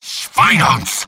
medic negative vocalization; tf2; mvm

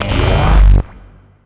vaccinator charge tier; tf2

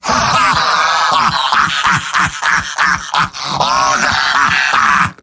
demoman laugh long; tf2; garrysmod; mvm

